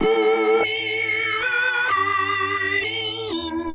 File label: 128 E, uk garage, Synths; Targa; 128bpm Lines n Loops